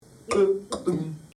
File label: American Bittern